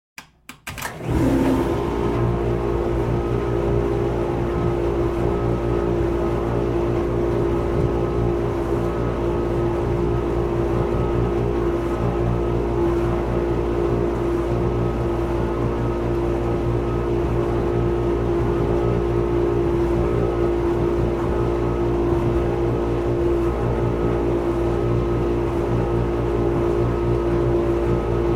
Dishwasher Turn on Run (Wash Dishes) Turn Off Drain Wat; Household